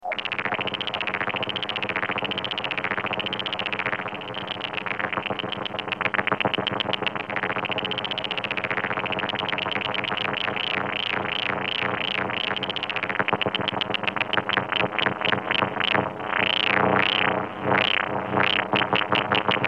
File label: Elektronika em25 filter; synth demos